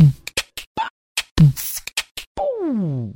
boom boh, beatbox; Drum loops

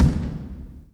Kicks

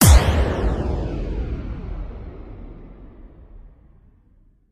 electric explosion; Crash Bang Boom

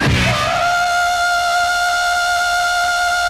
Stabs

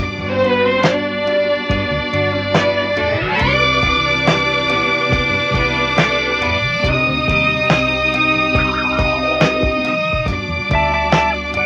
strings, phonky loops

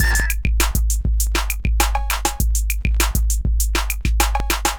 Drum loops, Electro

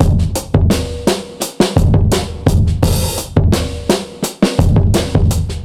Drum loops Boombap

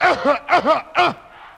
cough in rhythm; ooh ahh; human

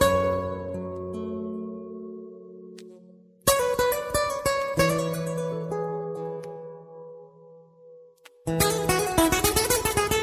guitar spanish noodles, Loops; Phonky

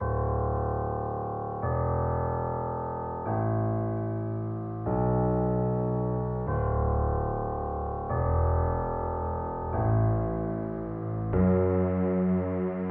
piano, sad loop

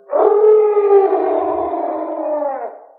dog howl; Animals

